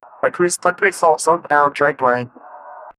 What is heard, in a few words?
brief jingle for a podcast; aviation